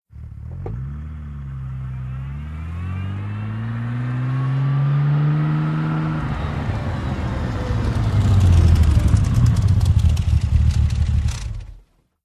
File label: Aston Martin Reverse; ext.